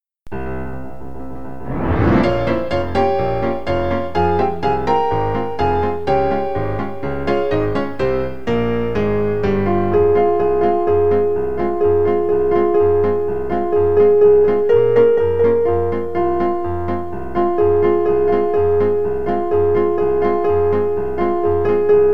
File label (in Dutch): melodie pauzelied